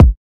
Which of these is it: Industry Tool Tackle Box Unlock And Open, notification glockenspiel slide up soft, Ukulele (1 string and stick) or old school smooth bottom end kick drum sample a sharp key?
old school smooth bottom end kick drum sample a sharp key